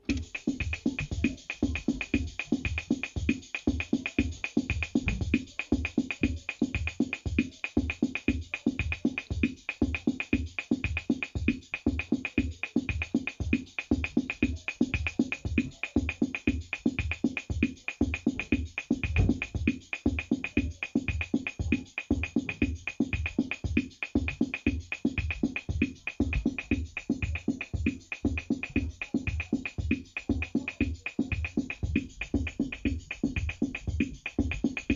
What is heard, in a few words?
bossanova